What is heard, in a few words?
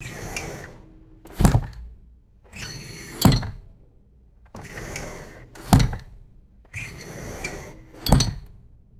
refrigerator door opening and closing with glass clinking; doors